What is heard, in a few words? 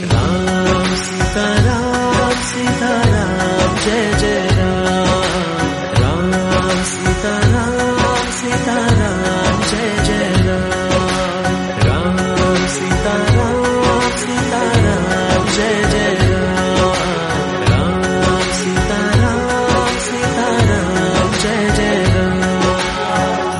90s tamil love bgm ringtone